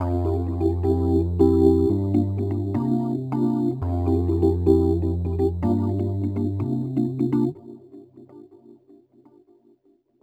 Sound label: Rhodes Attacks; Ueberschall